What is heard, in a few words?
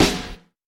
00s nice acoustic snare sound f key, snare drum sound tuned to the F note; snare drum samples